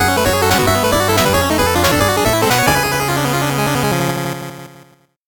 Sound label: 8bit jingle fast